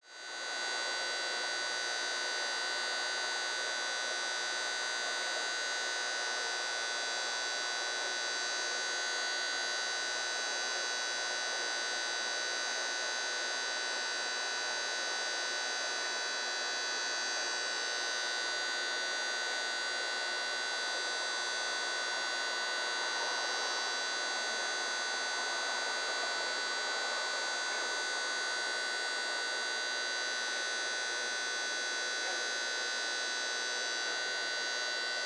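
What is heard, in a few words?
noise of electricity of street lamp; shum elektrichestva ulichnogo fonarya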